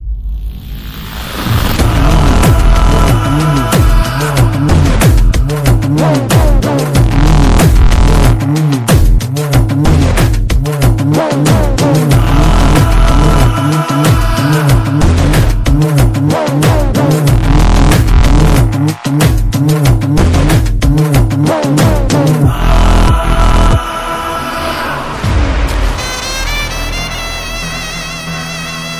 RINGTONE; CIA

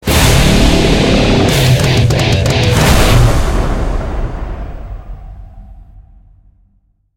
Level Up; Cod 4 Mp

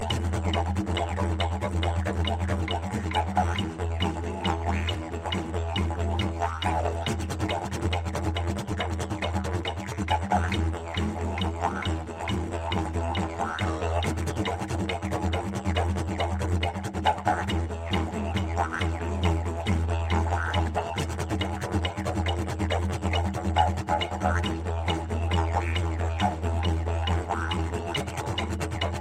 Didgeridoo

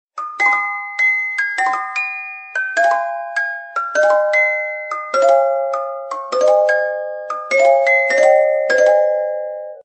Music Box

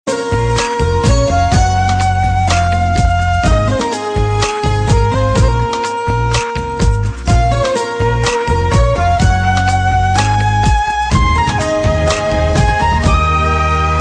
Music Cool

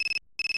text tone

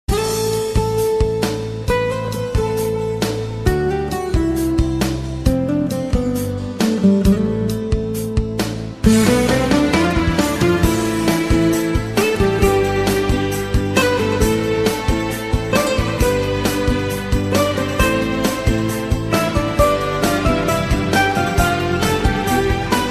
Slow Music

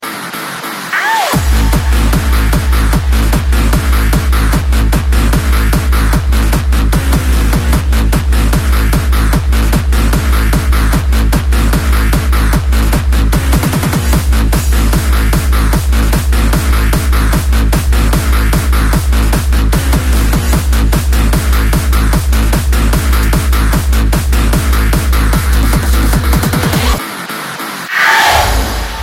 Hardstyle

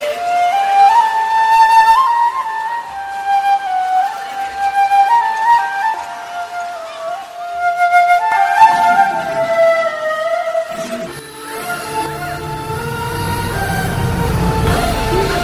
Flute

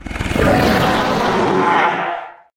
Allosaurus

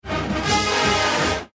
Notif

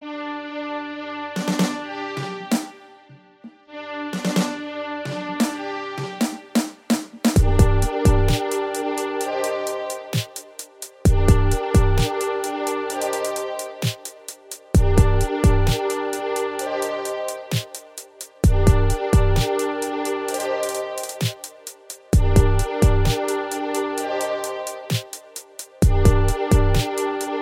Trumpets

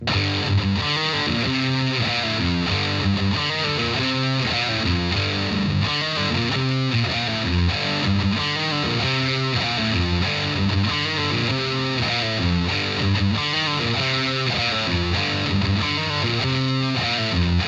Heavy Metal Guitar

